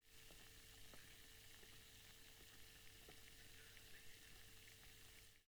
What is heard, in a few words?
Dissolve